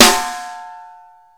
sizzle snare drum sound F sharp key, Royality free snare one shot tuned to the F# note